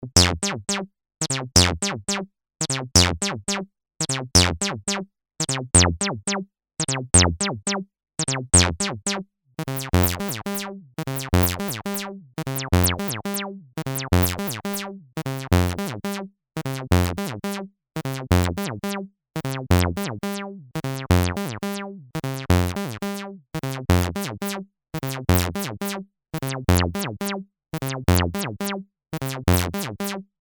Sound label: TD-3 Filter FM